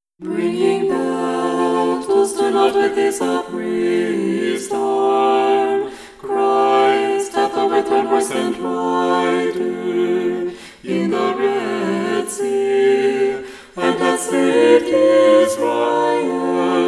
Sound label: Irmos; Choir